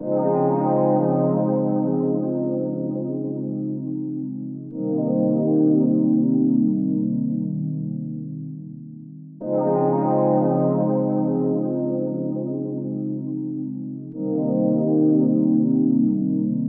102 BPM F Min Chords, Loop Stems; Cymatics; 2022 Melody Mystery Expansion; Tropicana

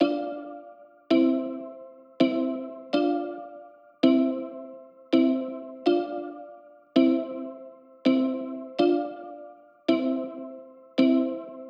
chords, 164 BPM A Min; Cymatics; Holy Lands

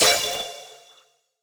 Simple Gravity Switch FX Dm7; Video Game; Cymatics; Video Game Sample Pack Vol 1

